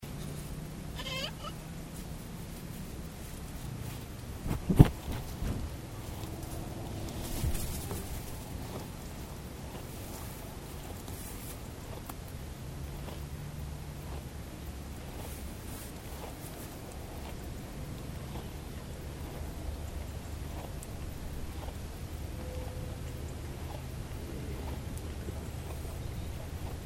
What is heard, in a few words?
cat and cow; fieldrecs